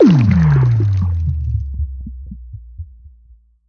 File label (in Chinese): 潮涌核心停用声